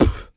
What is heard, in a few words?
Human Beatbox, Beatbox Hits; Loopmasters; Vocal Hits And Kits; Human Beatbox 1